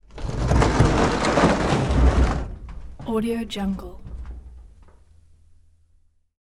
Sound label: metal scrape dragging car hood across asphalt